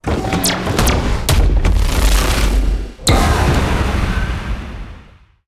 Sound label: Fast Bullet; starbites